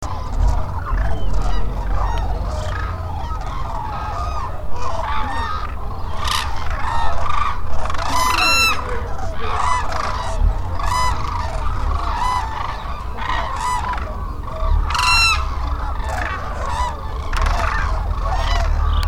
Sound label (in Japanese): nabeduru chijyou